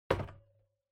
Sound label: Portable Stereo Put